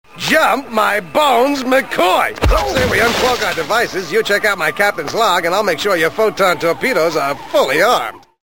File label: jump bone